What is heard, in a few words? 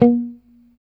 C5 sus, Strat guitar; Spectrasonics; Hans Zimmer Guitars VOL-1; JC-STRAT MTE